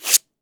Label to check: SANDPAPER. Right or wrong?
Right